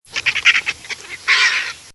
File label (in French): marabout; MOBICLIC 64